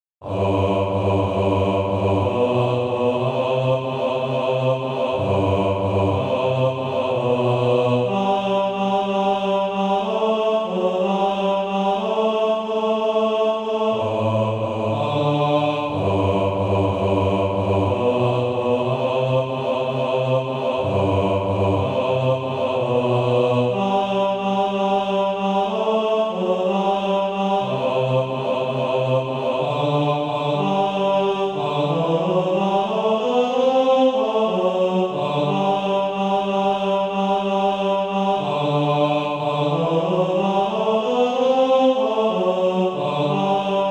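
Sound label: bass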